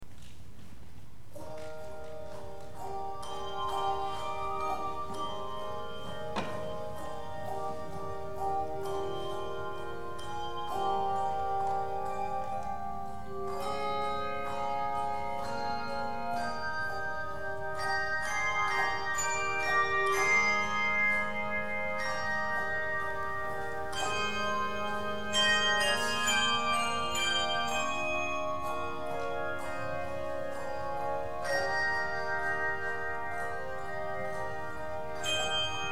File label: Handbells; handbell anthems